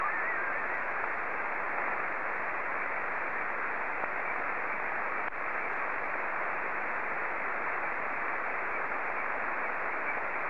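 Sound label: SSTV, Martin 1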